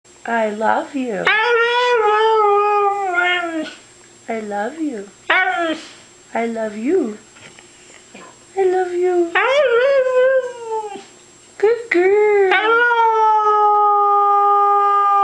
Husky Say i Love U

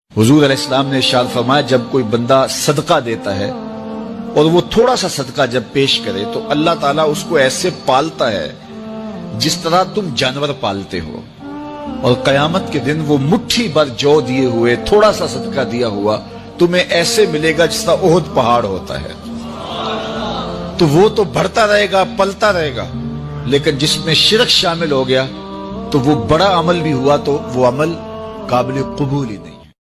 Islamic Ringtone

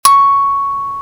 iPhone 11 Pro Max Original Sms Ringtone

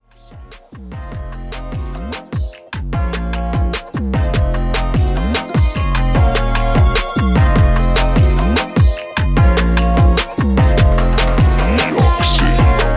Latino Instrumental Ringtone

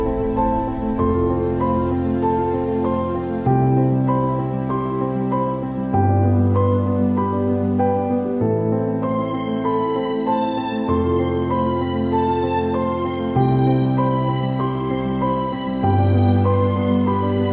Vivo V11Pro Ringtone

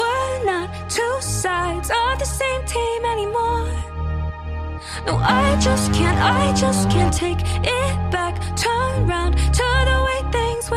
Song Slowed Version Ringtone

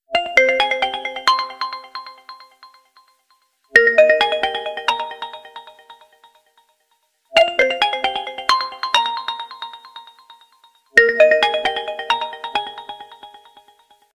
Oppo Reno5 Pro Plus Official Ringtone

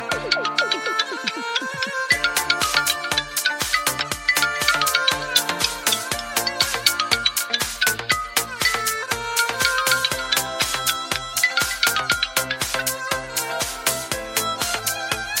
Infinix Ringtone

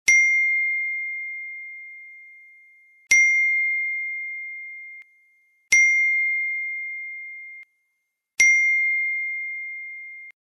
Ring Bell Ringtone; Iphone

